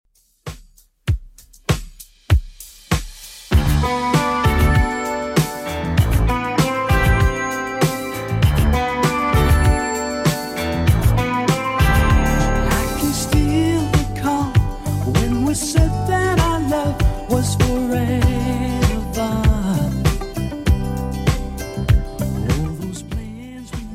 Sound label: 70s Redrum 98bpm